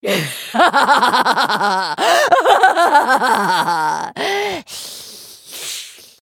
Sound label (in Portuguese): risada; cassiopeia lol